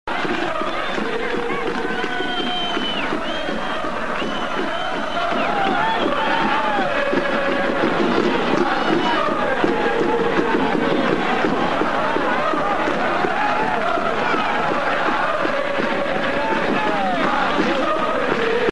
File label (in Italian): cori